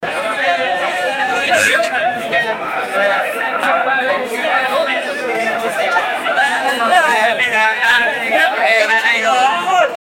Jamaican bar chatter; culture

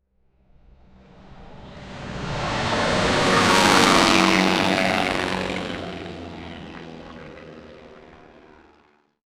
airplane flying; aviation

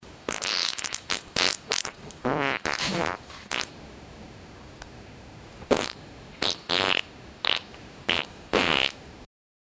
big rumbly wet farts

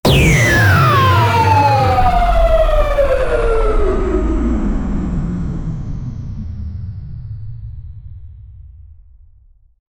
space ship

